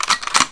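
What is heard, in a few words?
shotgun cock; duke nukem total meltdown; my house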